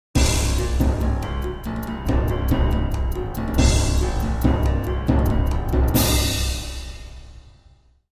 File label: jingle